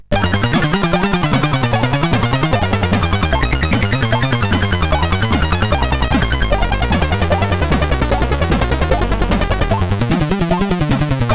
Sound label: sid, CSG 8580 R5